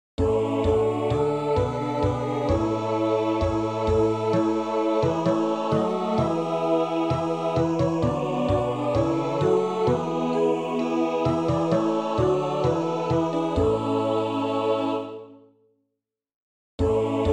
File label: Now is the month of Maying Alle stemmer